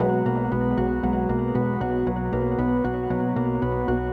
Music Bonus unlock; sayonara wild hearts 2019 gamerip

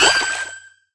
Se Fish Hit

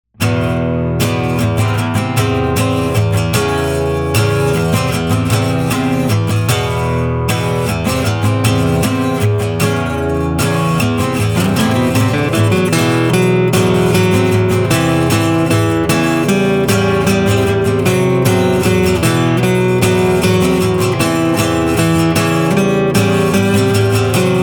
Acoustic; Goldeneye Watch Theme

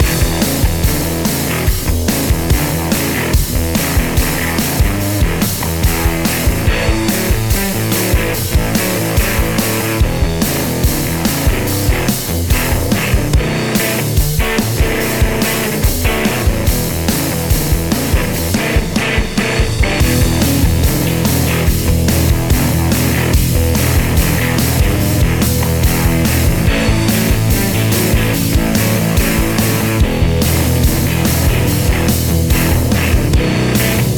Juke Box Rock

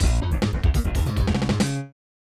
Unused Jingle; Zenkoku Koukou Soccer Senshuken; SNES

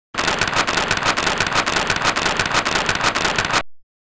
Applause; Oretachi Game Center Zoku; Karate Champ

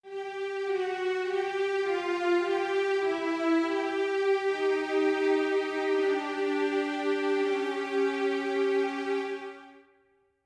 strings

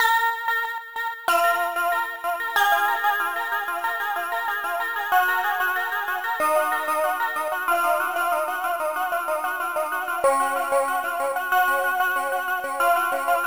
BGM; Seven Gates of Jambala; amiga

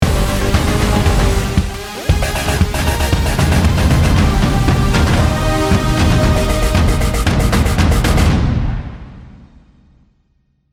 Round MVP Anthem; Counter-Strike 2

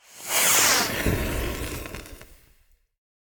Sound Effect Ball Of Light

